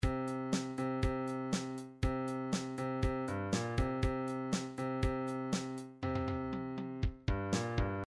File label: midi drums bridge